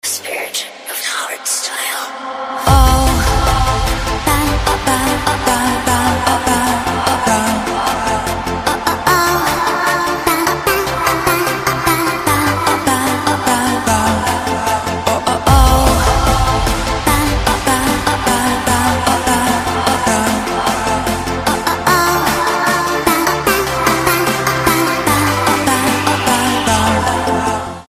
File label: hardstyle